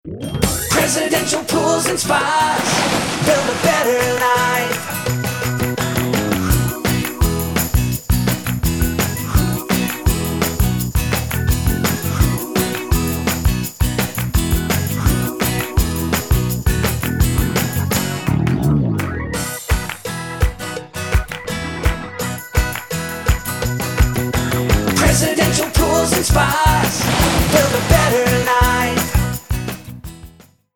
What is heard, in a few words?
Pools Spas, spa sales and service jingles; Presidential